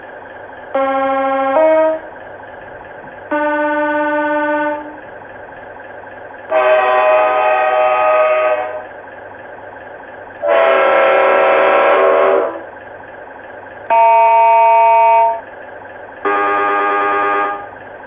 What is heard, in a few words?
diesel horns